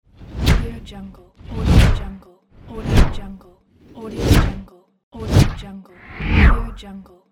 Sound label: woosh